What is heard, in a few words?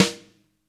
snare brush; Roland L-CD701; Snares 4; Sn Modules 4